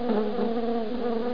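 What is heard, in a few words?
swamp, ambient; Quake; PainKeep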